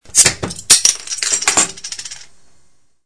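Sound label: bottle opening; otkrytie butylki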